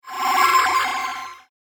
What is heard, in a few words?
Magical Whoosh; Whoosh Sound Effects